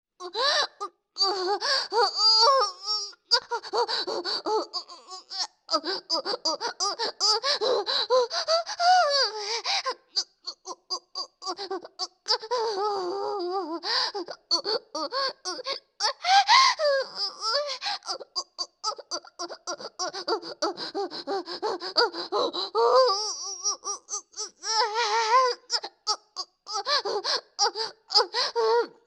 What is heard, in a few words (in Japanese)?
オホ声; SEなし